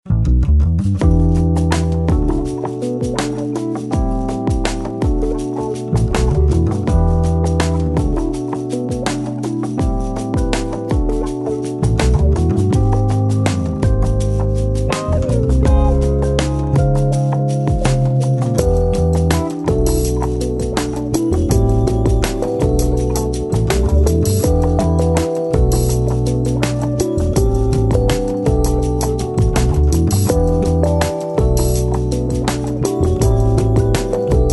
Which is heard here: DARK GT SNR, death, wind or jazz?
jazz